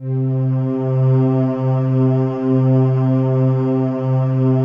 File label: PAD, DIGI-PAD; Best Service; XXL 800 Pads; Sample CDs